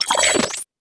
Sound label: tick